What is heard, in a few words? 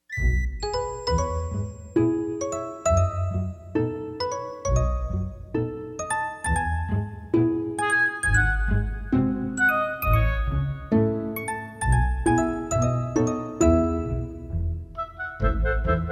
Music Box Dance Of The Hours; Baby Einstein Playtime